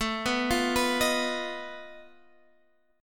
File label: F 7th Flat 5th A